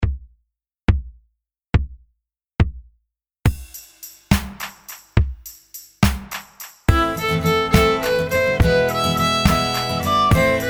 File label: play along 70 bpm; coleraine